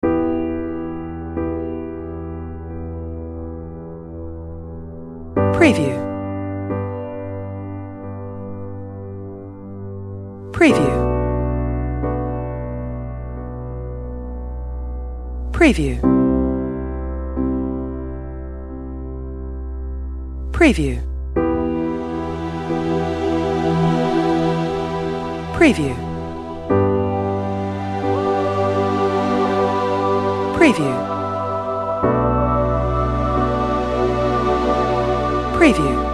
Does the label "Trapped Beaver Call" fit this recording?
No